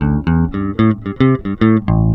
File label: FREE L 111 A, Bass Grooves; USB Soundscan vol.30; AKAI